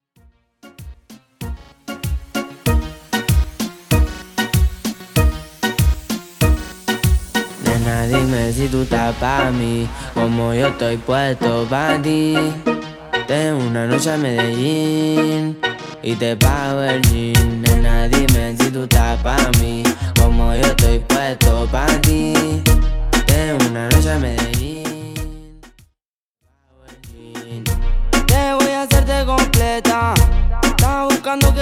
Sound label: Intro 96BPM